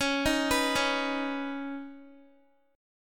Db 7th Suspended 2nd, Guitar; Guitar-4F,4A,5C,5G,5C,6E; Db7sus2 arpeggiated

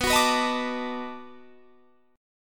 B 7th Sharp 9th, down, Guitar, Standard; strummed

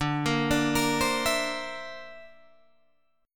D 7th Suspended 2nd Sharp 5th, Guitar Drop D

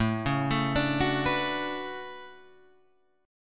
D Major 13th A, chord

